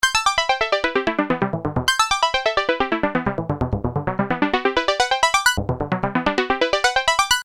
Arpeggio 130 G, Techno Synth Loops; 130bpm; Sonic Foundry (Sony Creative Software); Signals I

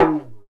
TALK DRUM, AFRO PERC; EdgeSounds; Drum Mashines VOL-1

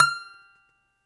DOMRA; E-MU Producer Series Vol. 7 – Old World Instruments

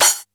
SPLASH CYM, D110 DRUMS; Crypton - DrumBase5000

